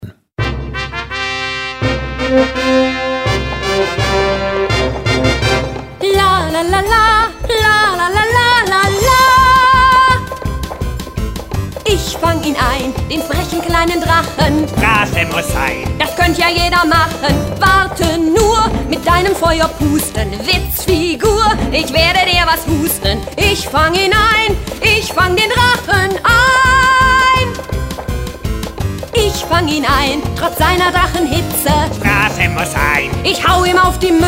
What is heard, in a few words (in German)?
Angriffslied